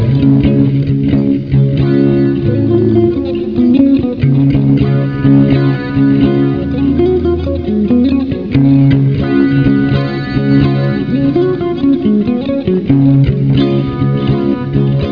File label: Clean